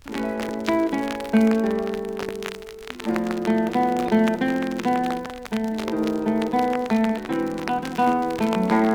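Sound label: guitar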